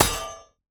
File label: UI Metal Armory Tab Dry; User Interface